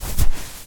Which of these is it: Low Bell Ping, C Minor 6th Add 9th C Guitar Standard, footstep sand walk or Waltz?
footstep sand walk